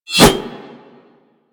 Tonfa Whoosh; Warframe; Tenno

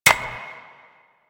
Bird Single Step, Ambience; bird three single step

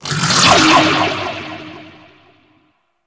Sentinel Sanctuary Ability; Warframe; Abilities

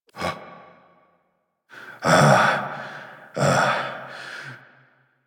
Shotgun Breaths; Kahl; Warframe; The New War; Grineer Eidolon Shotgun

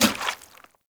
Heavy Grunt Boot Walk Water, footsteps; Sinecure

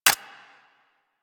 Arch Burst Gun Low Clip Mech, Weapons; DE; Warframe; Archwing

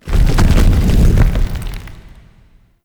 Boulder Stop, traps; Objects; Champion Crypt

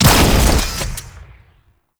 Flak Cannon Fire; Warframe; UT Flak Cannon

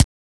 Weapon Punch SMG

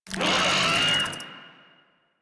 Desert Skate Vocal Pain B; Warframe

